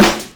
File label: boom bap hip hop acoustic snare sound e key